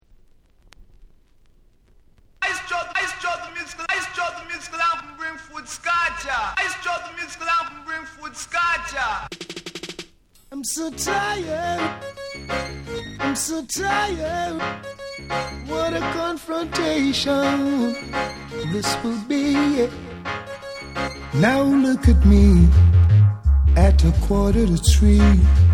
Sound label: REGGAE HOUSE MUSIC